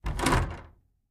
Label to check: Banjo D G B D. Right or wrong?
Wrong